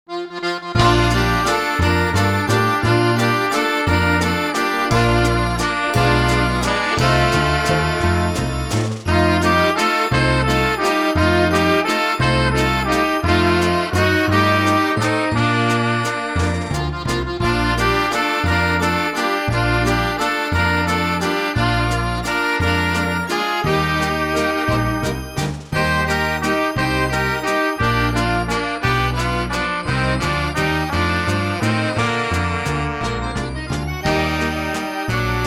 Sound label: Kukavica CROATIAN WALTZ